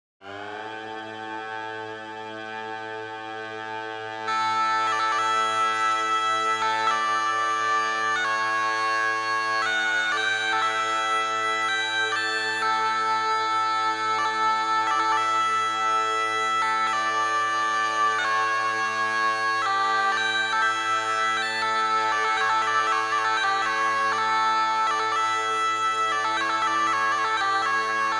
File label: Bagpipe 120bpm